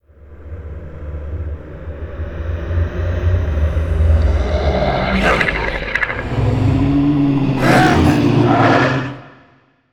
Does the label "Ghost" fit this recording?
Yes